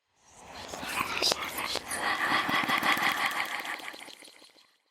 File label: haunted whispers